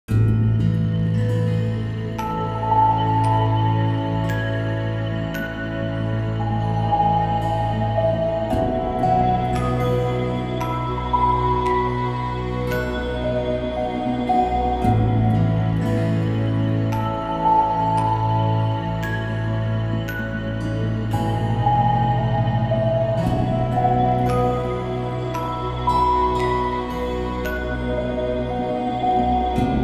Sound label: Healing Music